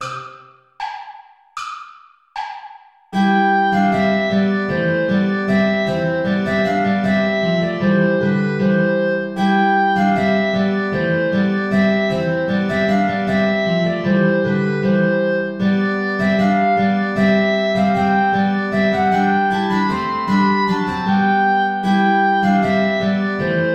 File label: deck the halls play along without guitar; traditional